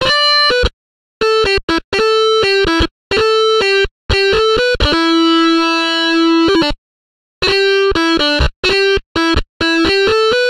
Epic Melody

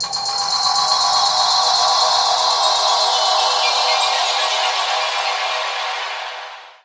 TECHNO FX 140

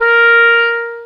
Alto Clarinet Portato A#3; VarazdinOrchestral

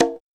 CONGA; drum; PERCUSSION

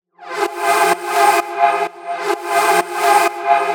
synth 128 Cm; Anthemic; synth loops

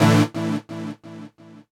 KEY SHOT TEKNO 140 A; Techno2; Singleshots

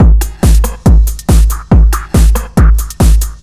TECHNO BEATS 140

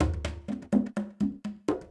PERCUSSN ETHNO 125, Drum

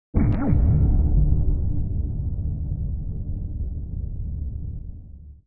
SPACE FX 140; SpaceSounds

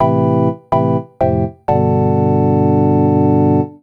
DEEPHOUSE ORGAN 125 A

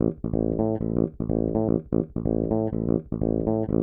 DEEPHOUSE BASS 125 A

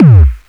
BASS DRUM 2 RAW; KillerTweaksII; DirectWave

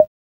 CR-78

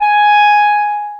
Alto Clarinet Portato G#4; VarazdinOrchestral

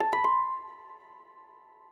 ethno, 125, A

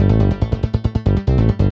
SNTH BASS ELCTR 140 A, Techno

